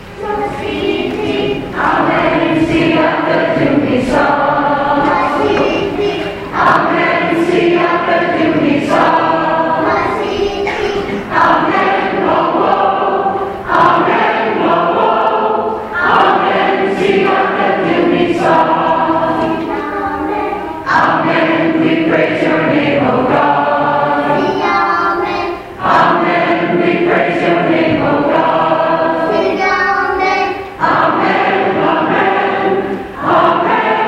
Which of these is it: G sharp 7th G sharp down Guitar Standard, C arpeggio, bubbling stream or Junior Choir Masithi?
Junior Choir Masithi